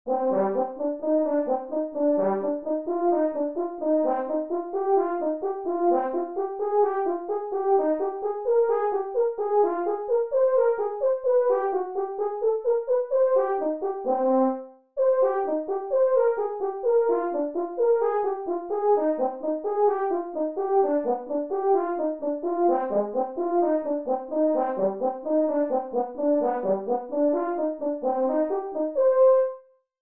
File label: horn; horn cycle 2